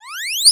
maximize, Interface Sounds; Kenney's Sound Pack

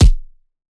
Punching Perc, Straight Glove; PROCESSED; 99Sounds; Punching Percussion

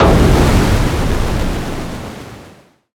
black project cinematic impact; Bluezone; Mysterious Cinematic Samples

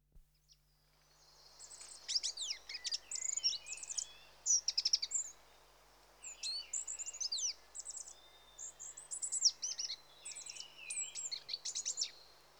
Goldcrest (Regulus Regulus) With; Birds; BBC Sound Effects Library

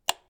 switch, UI Audio; Kenney's Sound Pack

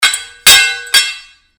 Sword Sound; FXHome; Fighting Sounds

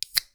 soda can; Sound Design Tools; Raw Foley

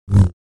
Saber; FXHome

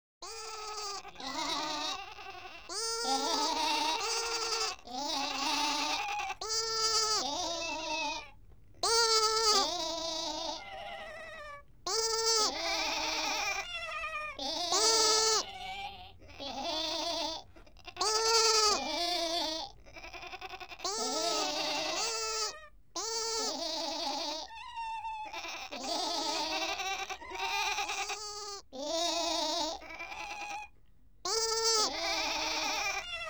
Animals, Sheep And Lambs; BBC Sound Effects Library

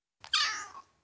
Ten Week Old Kitten, Individual, Cats; BBC Sound Effects Library

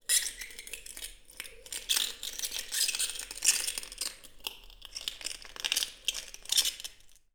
Glass Scrape; 99Sounds; The Warehouse

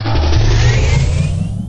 TECH CHARGER Power Up; GameBurp; 2000 Game SFX

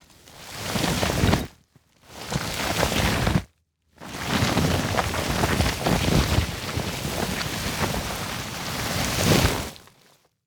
Body Drag on Farm Lane; SoundBits; Drag & Slide

